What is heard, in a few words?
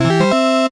victory